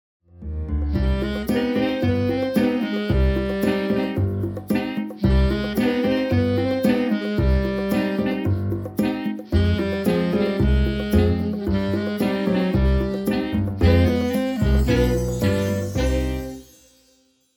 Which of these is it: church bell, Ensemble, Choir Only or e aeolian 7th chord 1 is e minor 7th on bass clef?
Ensemble